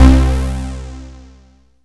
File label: synt, Juno-2; Giga Samples Collection; Bass